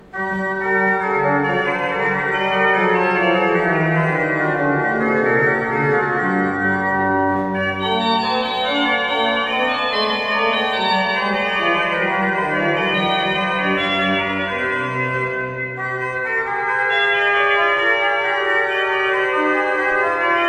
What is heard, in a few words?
Tranttenimento, orgue; Reckingen; valais; Fedele Fenaroli